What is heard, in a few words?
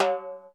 TIMB, SY99; Crypton - DrumBase5000